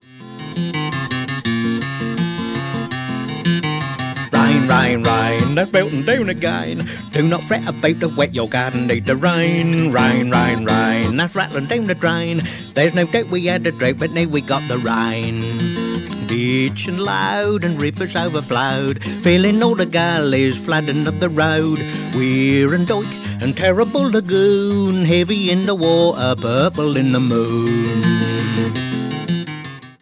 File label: Rain Rain Rain